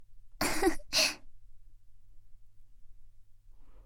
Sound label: laugh, ASMR; Garden; WithRin-Nazo01